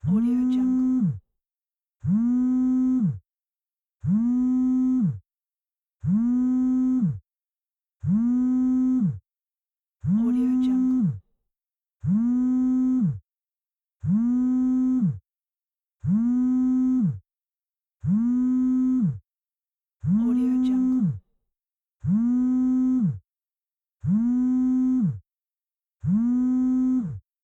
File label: phone vibrate